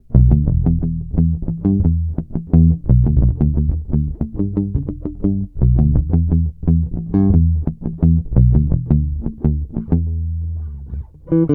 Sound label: P Bass Pick Muted Line